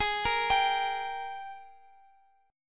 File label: Ab Major 7th Suspended 2nd, Guitar, Open F; arpeggiated; down